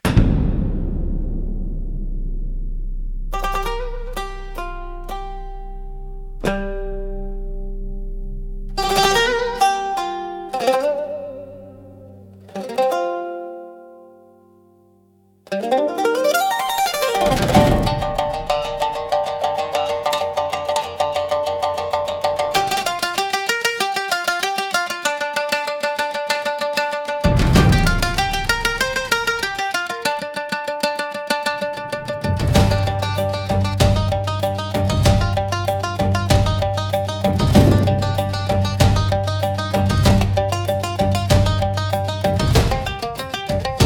Breath Between Thunder, Japanese drums/battle rhythm (for power, tension, battle, and hea; battle rhythm for power tension battle and heartbeat